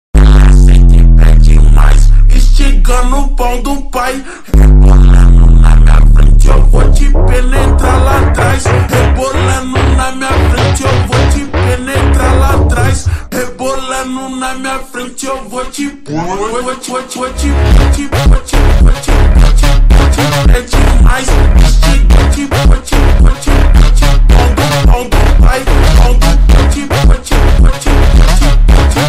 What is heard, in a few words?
slowed, funk